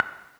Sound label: spoken feedback toggle countdown high; accessibility; chromeos